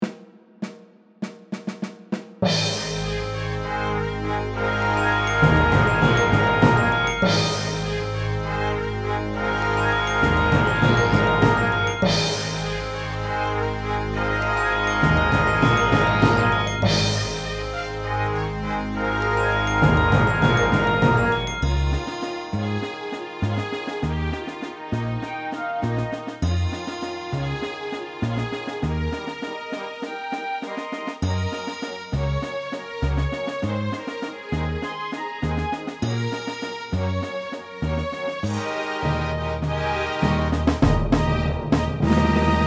Brass Force Eb, Eb Backing

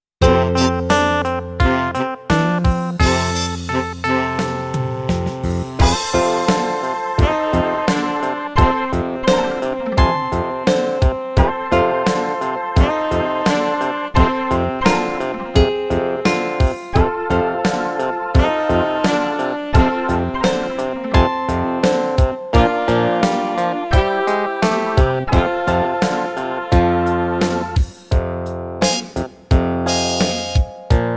Flat Note Blues F

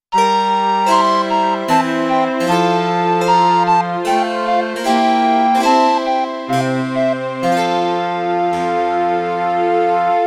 Minuet F, Backing

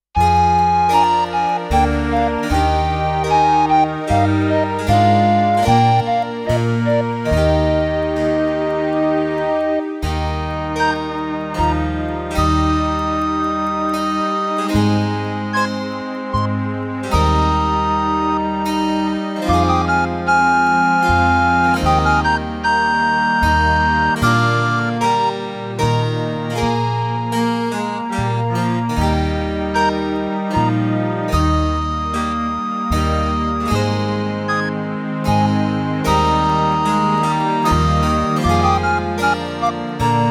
Minuet Eb, Backing

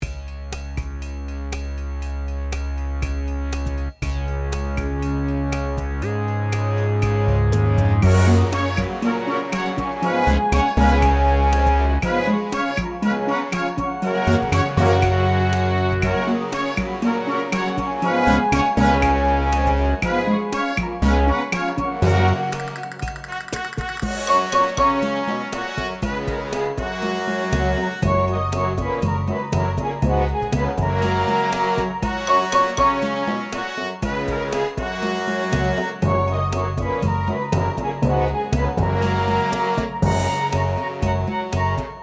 Brass F

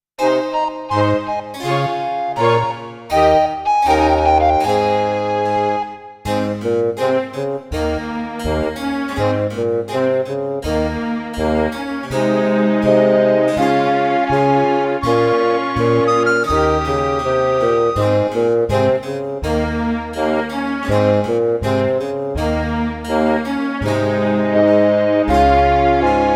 Gavotte Bb, Backing

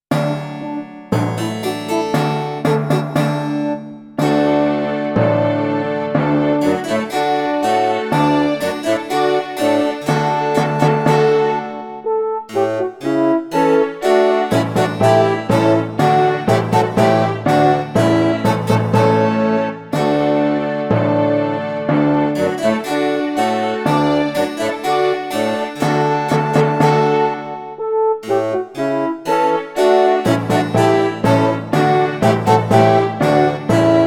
March Duet Bb, Backing

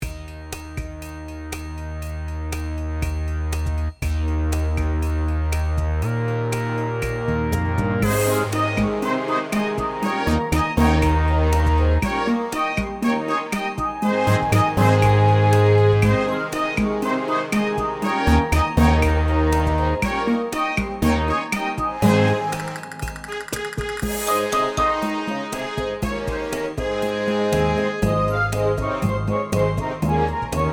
Eb, brass backing

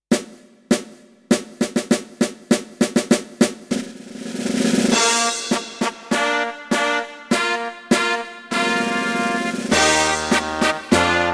Fanfare Opener Bb

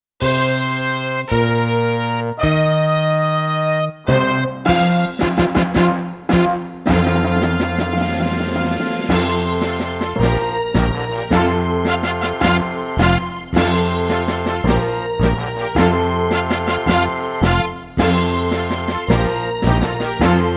F, Backing

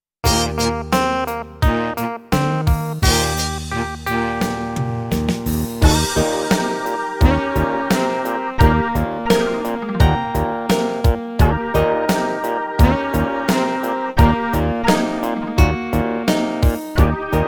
Flat Note Blues Eb, Eb Backing

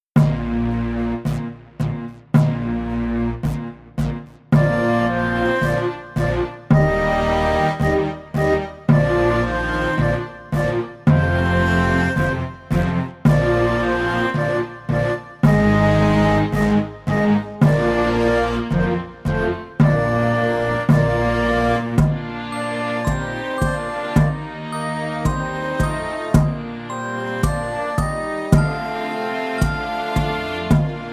Medieval Dance

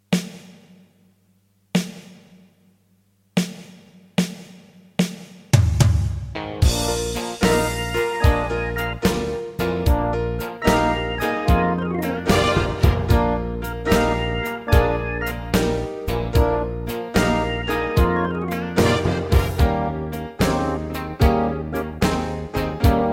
Blues

